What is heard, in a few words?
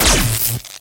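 super powerful electric shot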